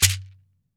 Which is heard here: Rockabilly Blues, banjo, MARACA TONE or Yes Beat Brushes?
MARACA TONE